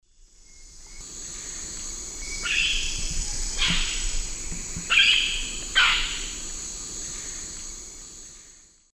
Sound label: Pionopsitta barrabandi, flight calls; S. America; Eastern Lowlands